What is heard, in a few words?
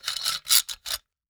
Gadget; Motors & Switches